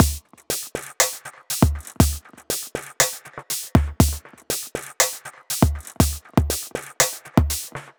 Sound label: Dub Beat, Drum Loop; Jack Dub Beat; Sonic Foundry (Sony Creative Software); The Electronic Music Manuscript Collection; Drum Loop Structures